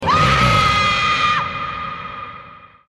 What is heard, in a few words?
scream